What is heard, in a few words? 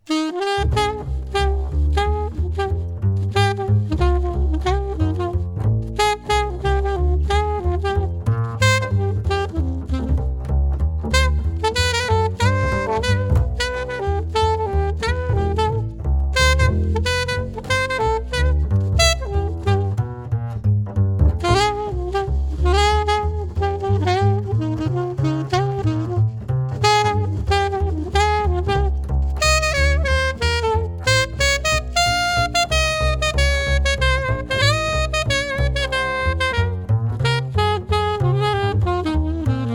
sax bass